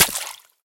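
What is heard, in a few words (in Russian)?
четкое попадание в водную цель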